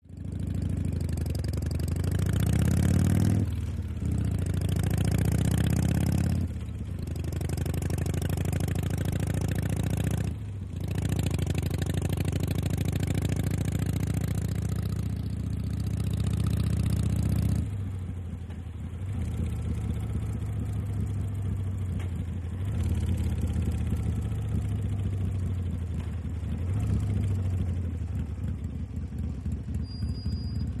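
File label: Motorcycle Harley Saddle; harley-davidson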